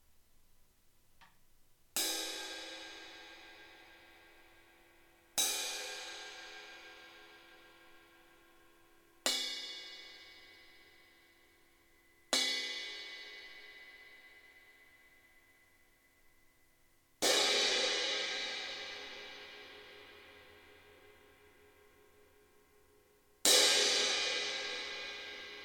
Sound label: Crash 18; crash bekken; Antique; Amedia